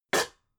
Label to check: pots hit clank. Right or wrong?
Right